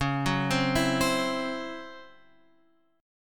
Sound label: C sharp Minor Major 7th Double Flat 5th, Guitar, Open F; arpeggiated; x,8,6,7,7,7